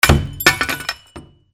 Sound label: FALLING